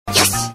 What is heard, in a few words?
hit; freak fortress 2; sagiri